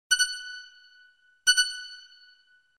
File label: chat alert; ringtones; sms